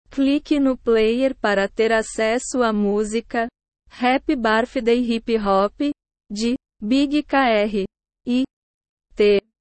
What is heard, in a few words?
happy birthday hip hop